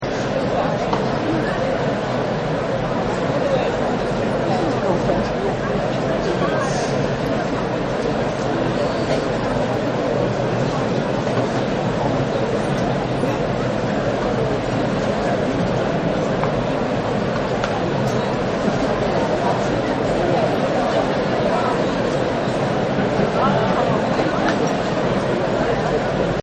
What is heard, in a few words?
Arena Ambience, crowd; Arena Ambience 1; Crowd Sound Effects